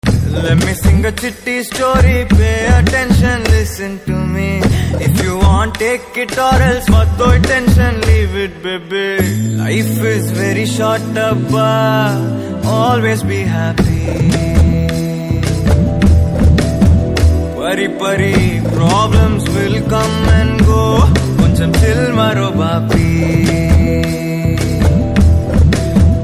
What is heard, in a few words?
Telugu Ringtone